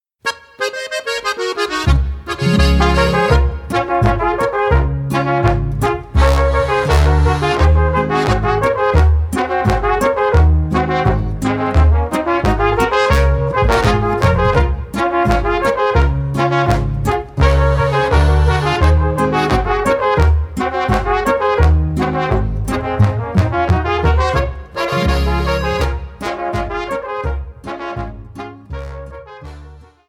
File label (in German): jubilaeums boarischer